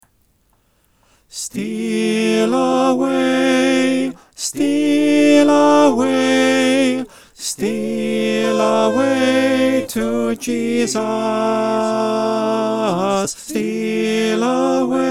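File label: tenor, choir; Steal Away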